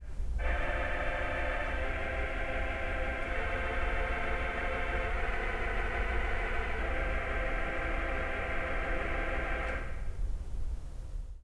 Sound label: voxes